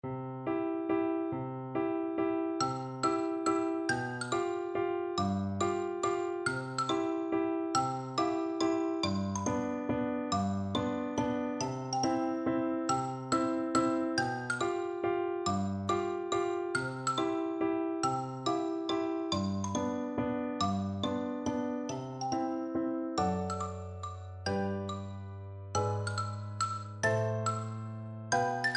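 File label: xylophone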